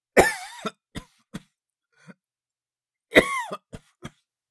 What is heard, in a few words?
Man coughing with a dry hack